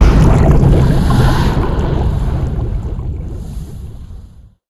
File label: hit sea object; Blind Drive